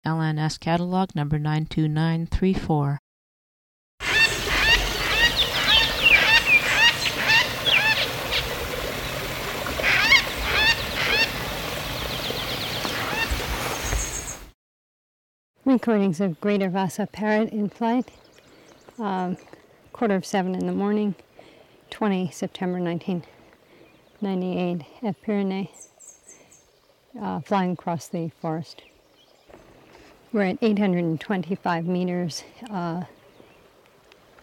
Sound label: vasa parrot